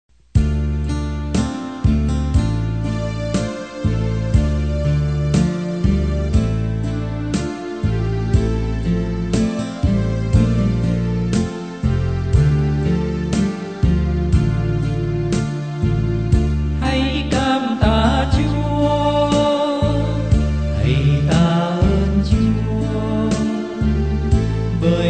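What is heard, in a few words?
soprano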